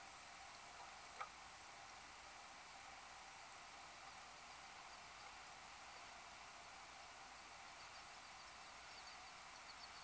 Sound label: Wing Whistle